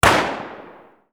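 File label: colt m16 exterior shot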